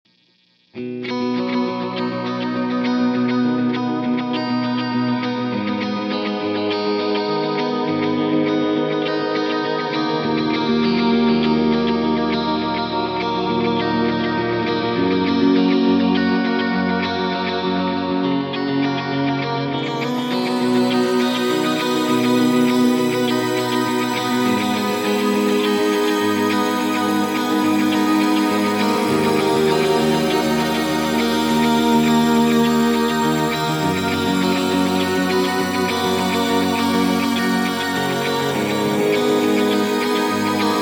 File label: Axe-Fx shimmer